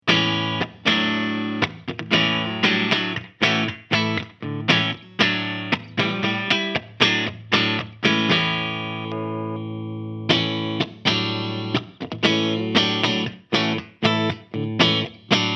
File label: LTD SC 20 Clean